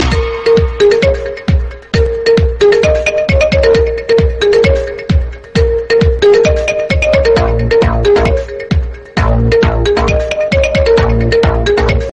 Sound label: key